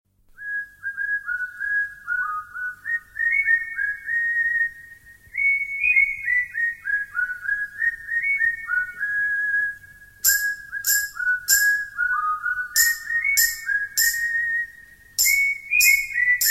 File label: Nice Whistle